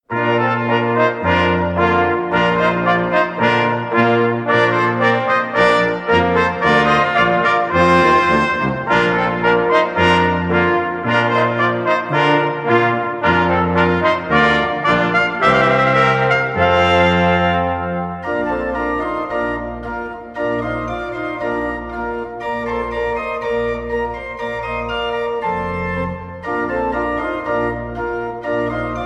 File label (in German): Festliches Praeludium fuer Blaeserquintett und Orgel